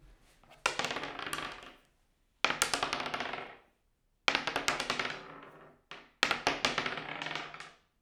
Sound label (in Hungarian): negy kocka egymas utani elvetese, dobokocka; Perkupa